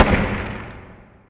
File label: asteroid damage carbonic big; Factorio